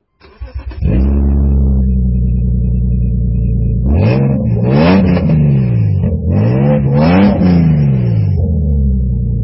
Subaru H6 3.0R Raptor Headers with cats No Exhaust